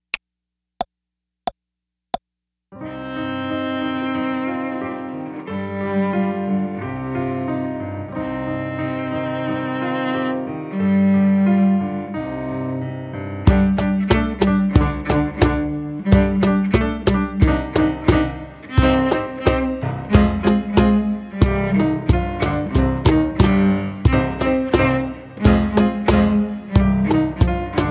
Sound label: instrumental